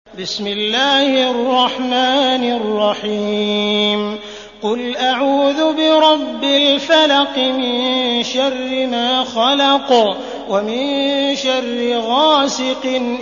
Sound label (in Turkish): Felak, kuran; sudeysi sureler